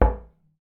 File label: glass, steps; VoxelEngine